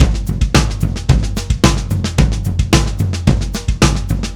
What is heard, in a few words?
Drums, Indie Rock; Session 01 - Brighton; Sonic Foundry (Sony Creative Software); Indie Rock Anthems